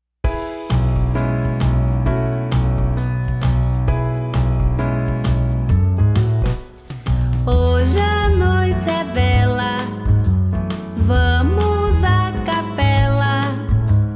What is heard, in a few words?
Jazzy Jingle Bells